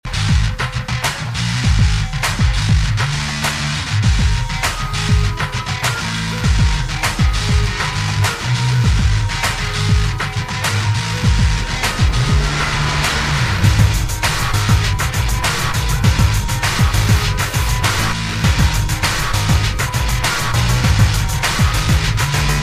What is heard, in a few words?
techno remix, ringtone; Dragon Ball Z; Cell theme